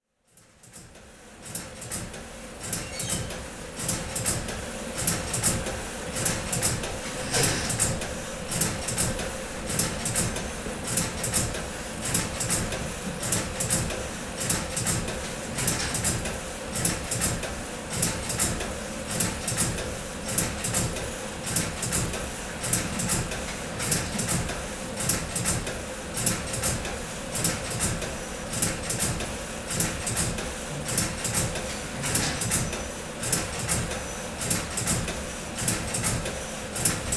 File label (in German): CD maschine